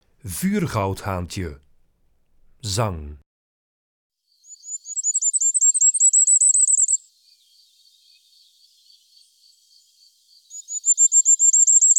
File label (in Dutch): Vuurgoudhaantje, Zangvogels; IVN